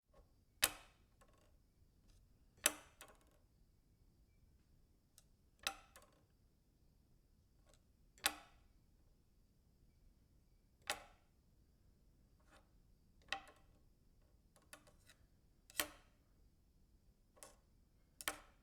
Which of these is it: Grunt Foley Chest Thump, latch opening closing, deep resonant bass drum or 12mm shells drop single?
latch opening closing